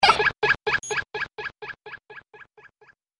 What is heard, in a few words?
glitch hopper